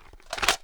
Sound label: mag in, weapons; garrysmod